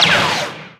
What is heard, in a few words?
blaster; probe droid